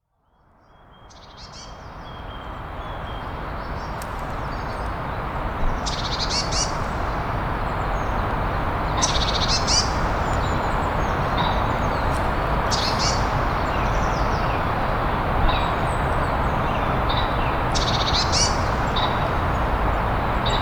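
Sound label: in the forest on a river bank; v lesu na beregu reki; zvuki prirodi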